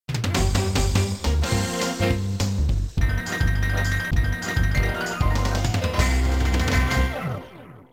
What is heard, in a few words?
bonus, music